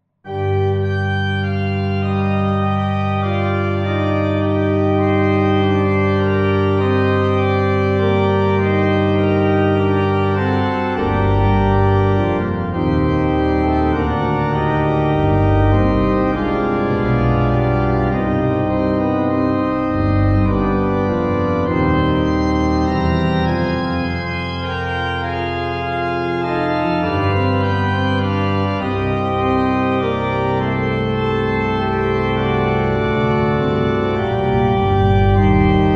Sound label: Prelude Gm; Muffat Ge